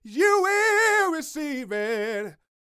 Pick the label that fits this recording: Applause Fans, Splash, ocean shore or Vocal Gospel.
Vocal Gospel